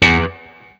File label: BLU POP, Guitar & Bass